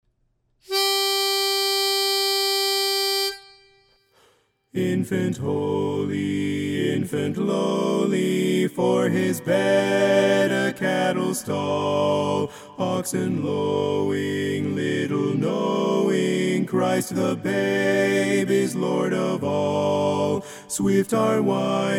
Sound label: BARI, Infant Holy, Infant Lowly